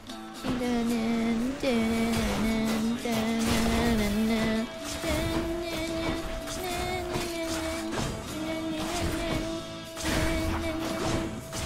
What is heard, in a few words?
Singing; Hades bgm; IRySinging